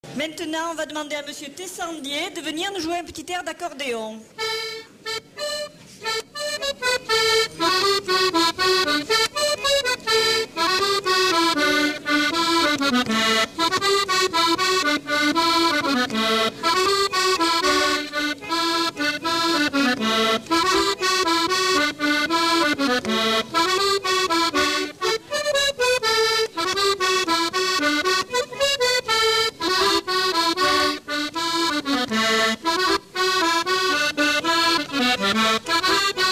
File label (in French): Accordeon